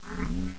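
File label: Wood Monster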